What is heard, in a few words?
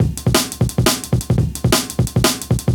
DNB BREAK